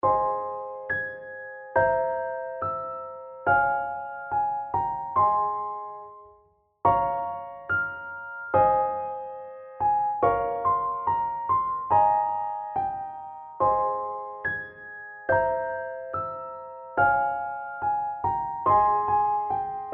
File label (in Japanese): 回想っぽいピアノ; 感動